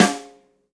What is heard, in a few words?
snare mf, drum kit; unpitched percussion